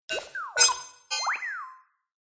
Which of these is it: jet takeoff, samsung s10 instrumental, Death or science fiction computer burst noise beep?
science fiction computer burst noise beep